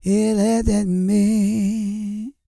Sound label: GOSPEL